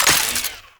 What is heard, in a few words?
Belt Fed Rifle Mech Stop; Warframe; Tenno; Frumentarius